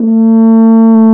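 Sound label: brass, FR HOR, F.Horns; Roland L-CD702; F.Horn 1&2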